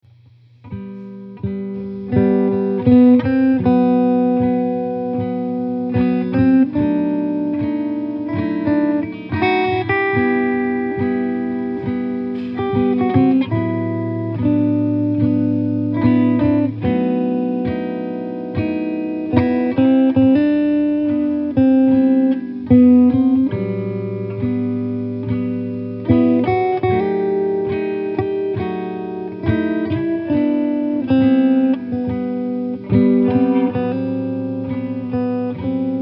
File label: Digging